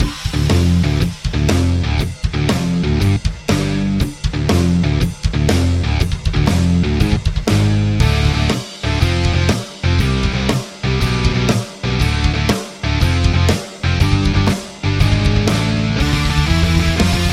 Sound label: Heavy Guitar Tone